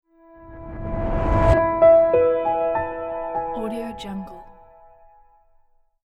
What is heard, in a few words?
modern animation logo